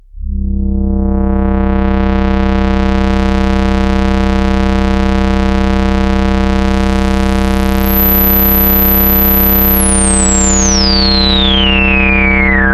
Korg NTS 1 Lowpass

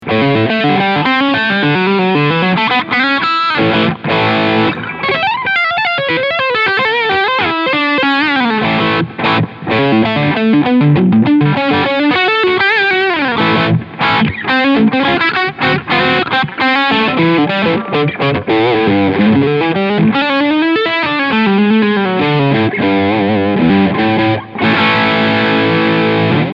Overdrive

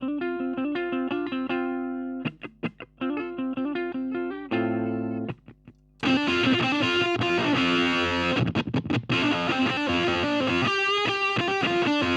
Supro 64 Reverb Fuzz Pedal

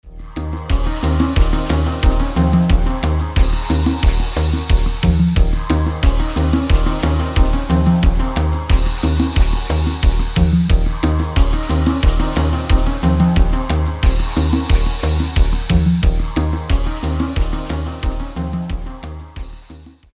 pop; akai

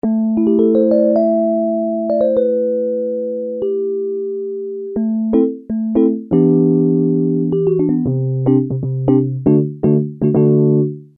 lounge stage

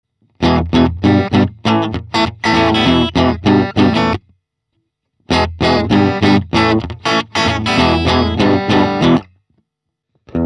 Amp Sim; Antelope Discrete 4

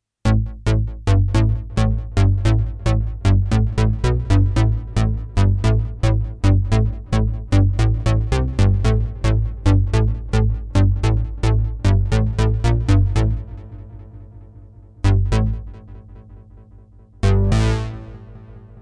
Sh-4d Model Sh 101 Bass

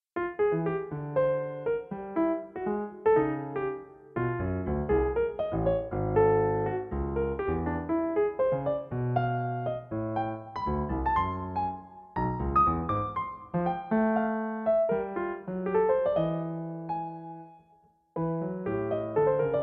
Pianoteq 5; Schnee

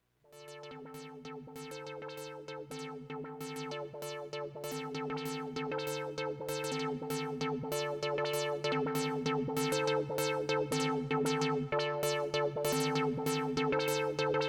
Subharmonicon; POLY ROCK

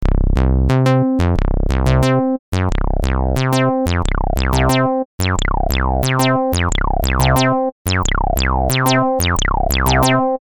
reso mod

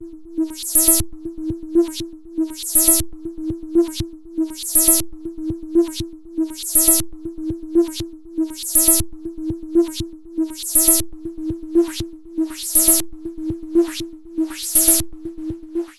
Dreadbox Drips V2

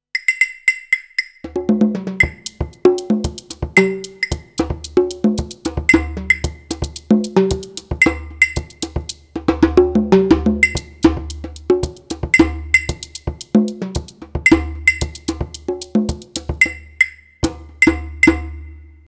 Alesis DM Dock Test Percussion Kit

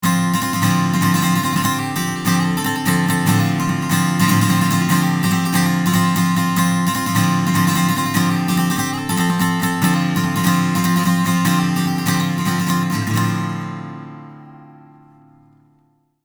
Heritage Audio HA 609A Acc Guit dry

